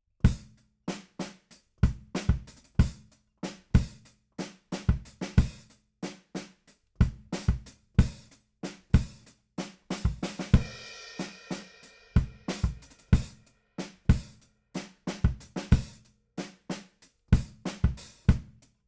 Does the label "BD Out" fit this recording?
Yes